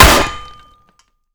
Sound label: Projectile Sounds; Red Orchestra 41-45